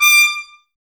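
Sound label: Brass trumpets D, Mute; Miroslav Vitous - Woodwind Brass Ensemble; Tps Mute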